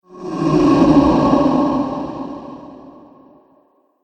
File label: Pyramid Phantom, ghost sound effects; Andrew Fleming